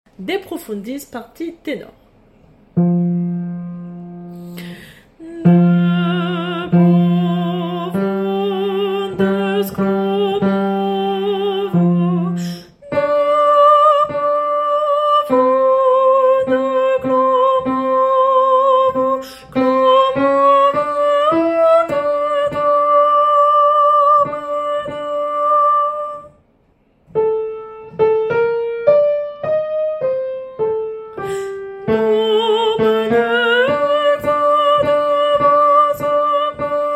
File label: tenor; De profundis page 2 et 3